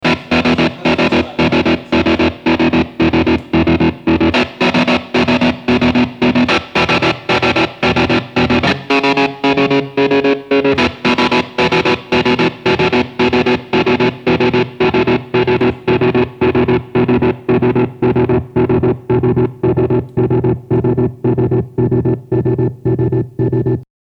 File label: Sawtooth Overdrive